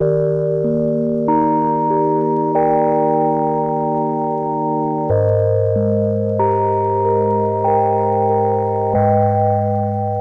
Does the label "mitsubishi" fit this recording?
No